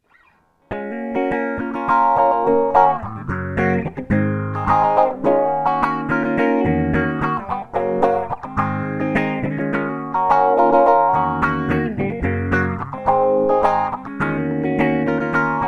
phaser

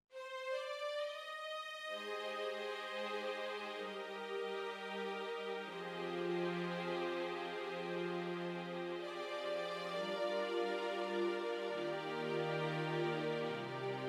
Adagio Strings; Artis SE

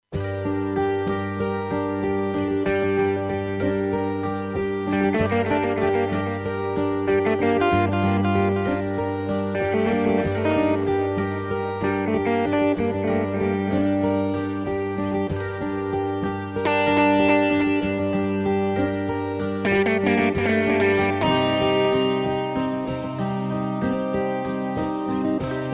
all guitars

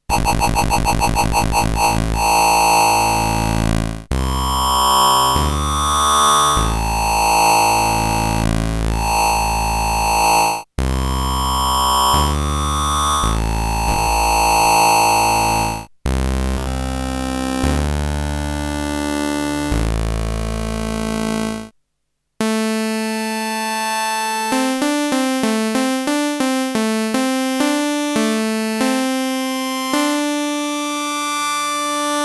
Liven 8bit morph engine

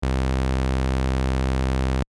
Arturia SAW; Brainworx

